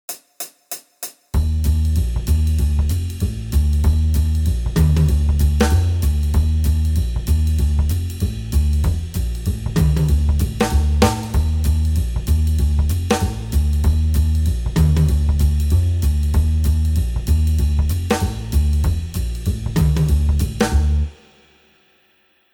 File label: Jazz backing